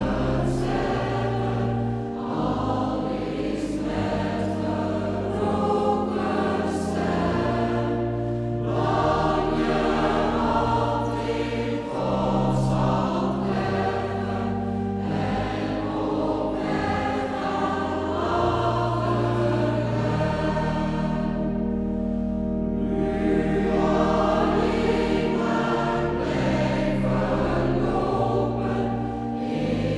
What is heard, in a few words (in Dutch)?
Koor gemengd; Gr Evangelisatiekoor Amersfoort